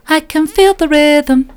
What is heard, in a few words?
i can feel the rhythm soft, vocal